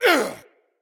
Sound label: attack grunt; smite; odin